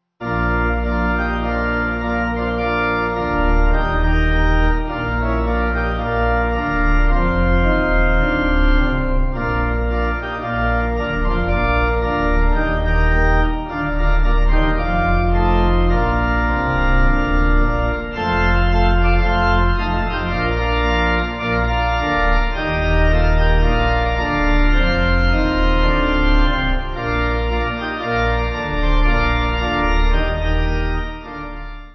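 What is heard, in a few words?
When Jesus Comes Pipe, Pipe Organ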